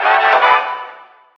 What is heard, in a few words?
Card Normal Stinger